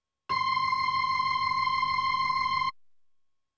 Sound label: B3 Organ; Masterbits CLIMAX 8